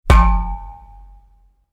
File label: dull metal hit with ring